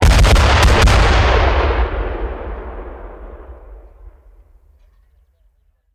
Mortar Cannon; Sound Ideas; Guns

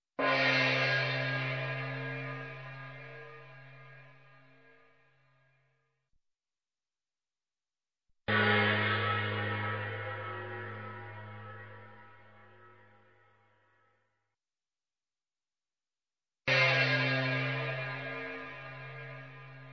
Gong medium Single Hit, Music, Percussion; Sound Ideas 2000 Series

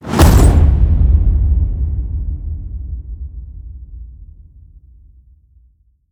HIT Metal Crash, Cinematic Hits Designed

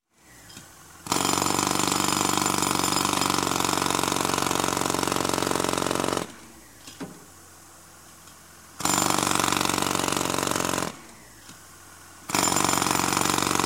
Construction, Jack Hammer Hammering, Short Bits; Sound Ideas 2000 Series

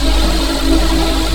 Projectile Flight Loop; Weapons

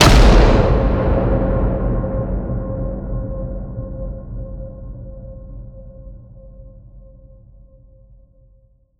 HIT Distorted, Cinematic Hits Designed

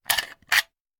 Hand Gun Foley; Sound Ideas; Guns

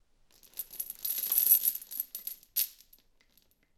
chain movement; DeadFright

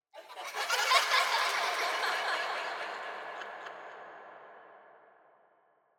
Children Laughter Eerie Reverb; Hollywood Edge; sound designer's toolkit v2

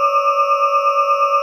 Sci-fi Hover Bot Loop; SFX Kit; Sci-Fi Loops

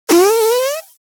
Fart Foley Strain, Thin And Windy

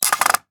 Cordless Electric Drill Tighten; Tools; 1001 Sound Effects

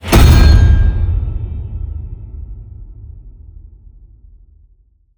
HIT Massive, Cinematic Hits Designed

